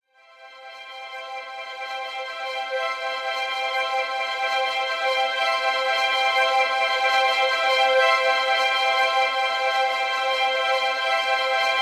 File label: String Sound; Legend of Zelda Skyward Sword